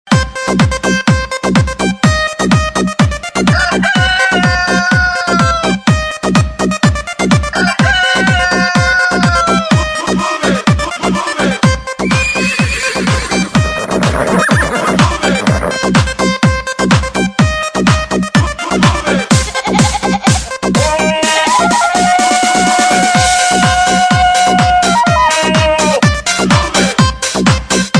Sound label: disco animals club music tone ringtone